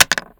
もの落とす

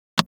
もの置く

